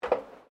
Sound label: FOOTSTEPS WOMENS HIGH HEELS ESCALATOR STEP